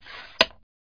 placing

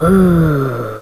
ouh; GEOGRAFIA

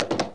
horse minimize

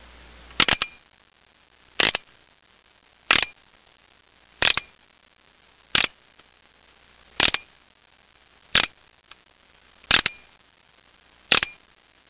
ratchet; Key Multimedia Greetings; SoftKey 1997

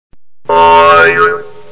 good fax; MRSHot2003

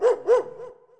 DOG; kingpin

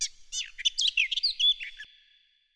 Forest bird; ImpGal2; colony

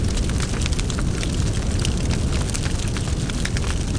Fire build; groundwar

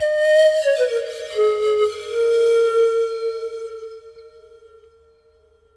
FLUTE ETHNO 125

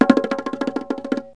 long drum, drums; Wired for Sound Pro CD; Aristosoft, Inc.

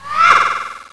Scissor Snip; Solarian II

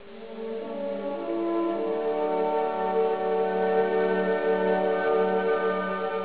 earth program error

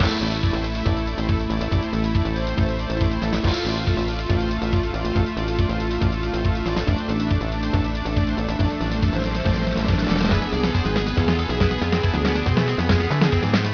bgm theme; The JukeBOX

